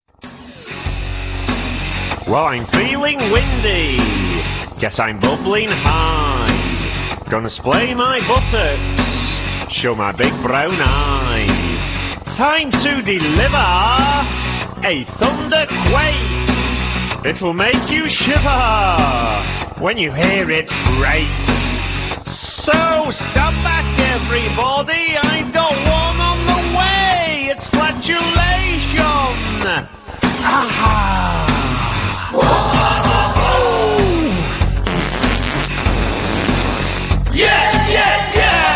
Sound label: flatulat